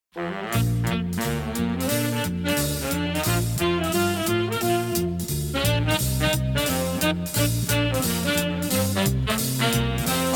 Newsreel Music; Treasure; Yankee Zephyr

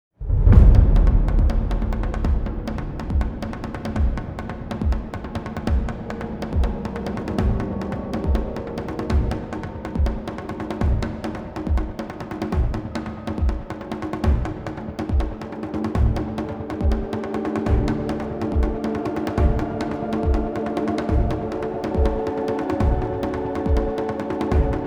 Taiko; Everly

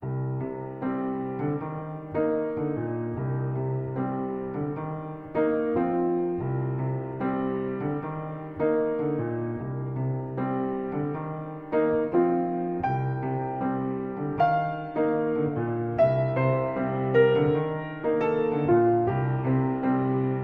piano movement

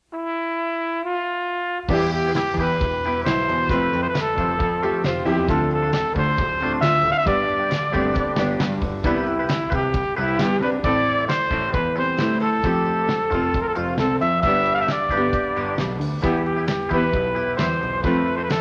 Sexy Dance with Trumpet

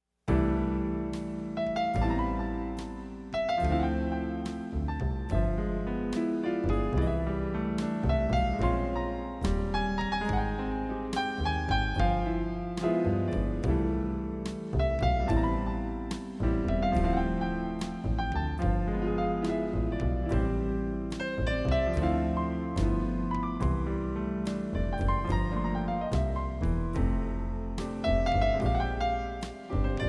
Dinner Piano Source; Safe House

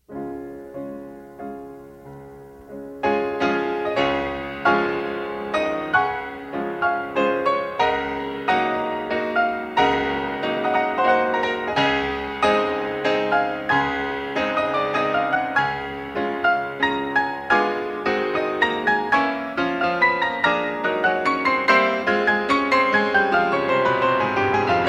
piano; Hulk; prometheus

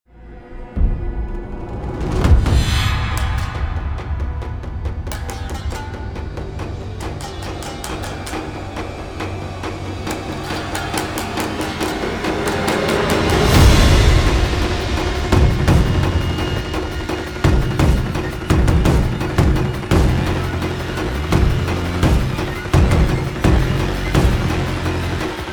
Taiko; Everly

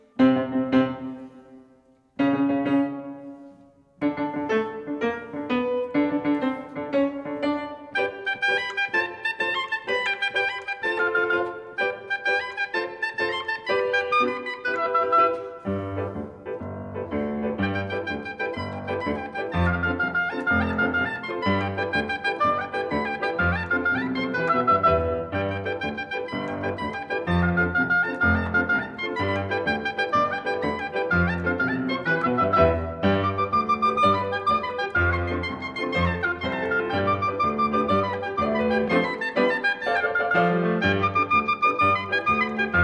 Suite For Piano and Oboe

